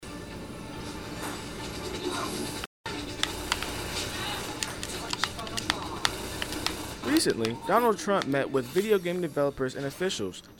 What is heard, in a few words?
Video Game